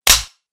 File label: click; attempt to shoot with no ammo left